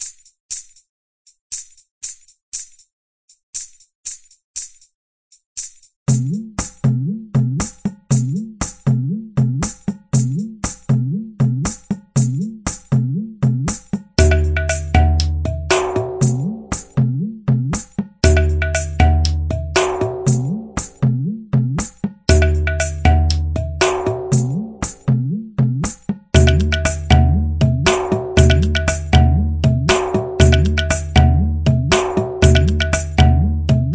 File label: SOUTH FOLK, grooves